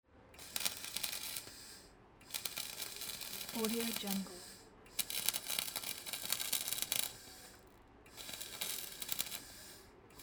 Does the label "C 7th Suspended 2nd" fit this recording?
No